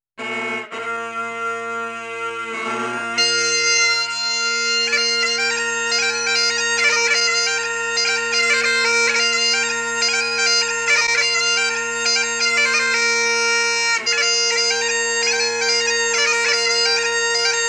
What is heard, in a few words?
Irish bagpipes music